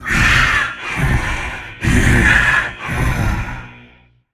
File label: breath, voicepack; smite; jorm